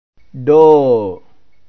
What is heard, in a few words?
door